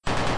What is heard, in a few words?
machinegun; Unciv; attack sounds, for different types of units